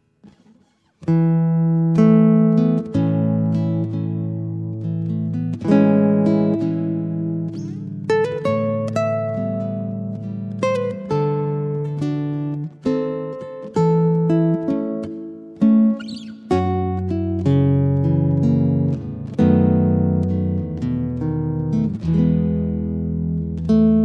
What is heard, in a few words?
Waltz